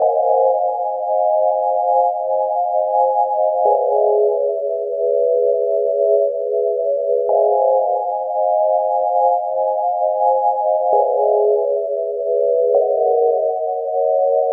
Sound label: Pad 132 BPM A#, Club Techno; Zero-G; Groove Loops